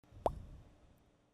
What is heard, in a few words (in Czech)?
bublina